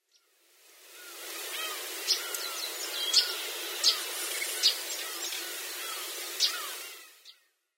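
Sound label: kibaramushikui, Yellow-browed Warbler; sakata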